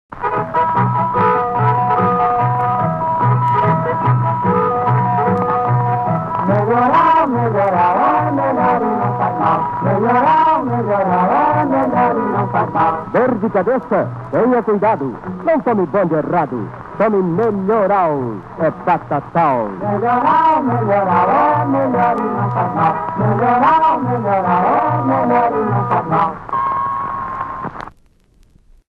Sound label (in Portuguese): jingle; melhoral